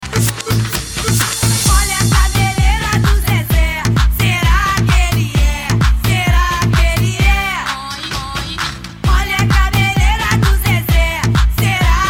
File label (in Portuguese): sound car carnaval